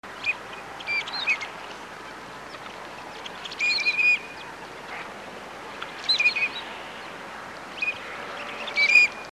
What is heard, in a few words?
anas flavirostris